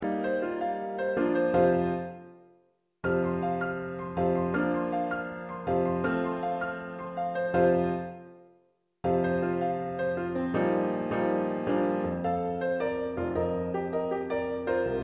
Piano

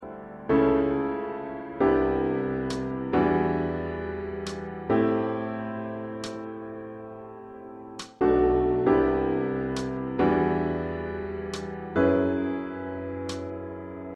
Accompaniment

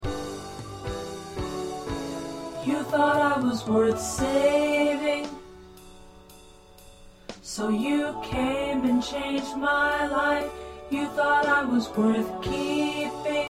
Tenor

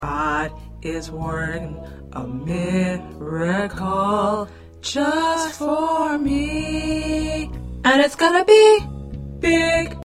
Tenor

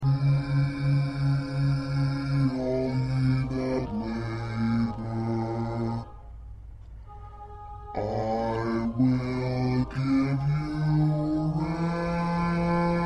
Bass

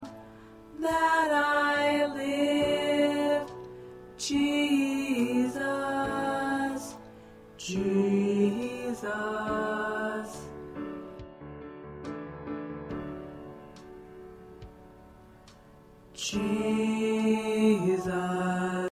Tenor, choir

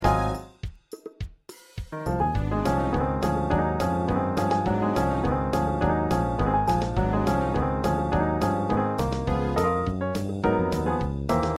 Accompaniment

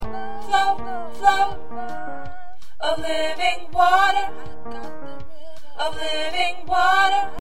Alto, choir

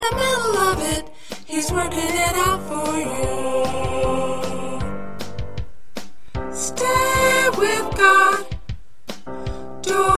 Soprano

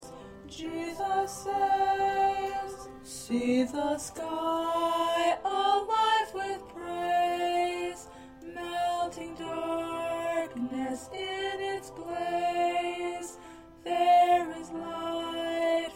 Alto

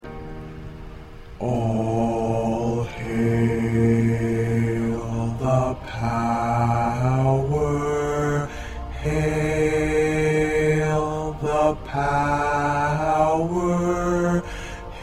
Bass; All Hail The Power GMWA

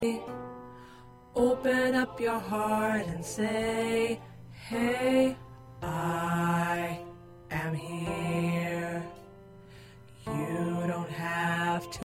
Tenor

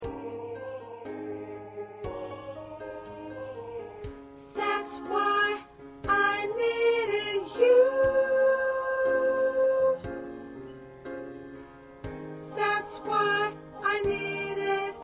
Soprano

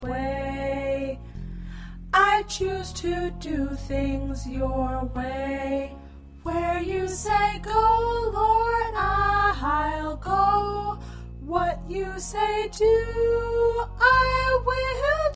P Soprano

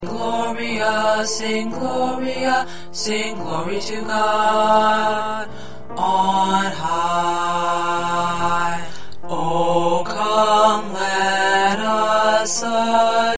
Tenor

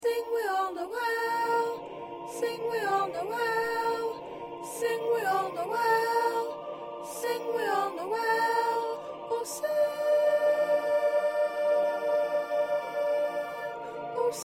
2nd Soprano

